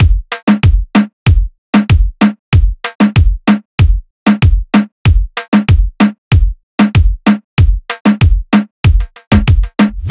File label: Drum Loop 95 BPM, Hip Hop; Drum Loops; Uno; Cymatics